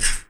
RSS PERC

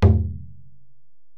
Ac Perc, Drum Machines Alesis DM-PRO

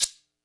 kit, Yamaha Motif-ES8

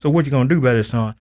SING, Blues Soul; Spectrasonics Vocal Planet

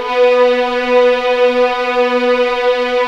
B3 EXP MF, VIOLINS; East West; The Ultimate String Collection

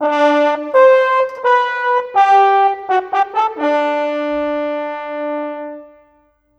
Horns, Cinematic; Sonic Foundry (Sony Creative Software); Orchestral Series VOL-3 - Cinematic

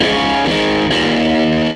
Strat Guitar Loop

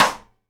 SIDE STICK, Live Snares

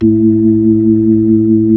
B-3, Keyboards of The 60's and 70's; Club Xfade

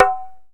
Indian Percussion Tabla; Mango Loops

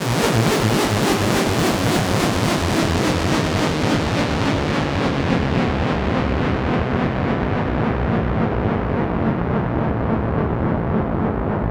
analog, special fx twisted; USB Soundscan; AKAI

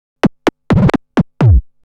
Scratch Fills 140BPM

